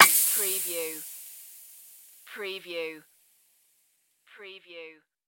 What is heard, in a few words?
Can Air Puncture Leak